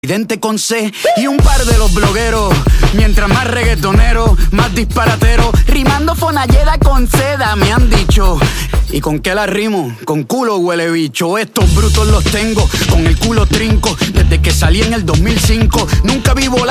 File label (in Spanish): bajo y bateria